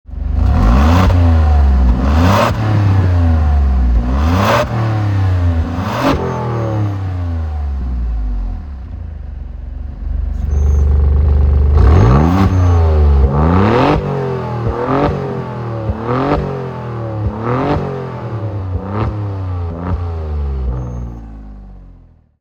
Alfa revs, alfa romeo giulia quadrifoglio akrapovic; etna red; carbon roof